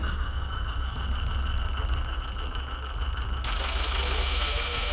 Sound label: wind whoosh; sound effects